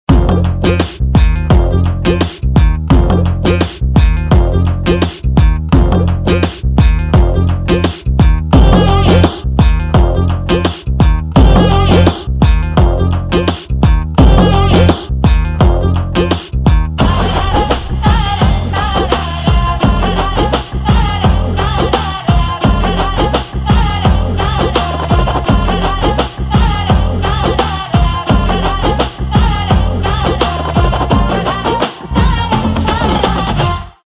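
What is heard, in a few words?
background music Ringtone; Chavu Kaburu Challaga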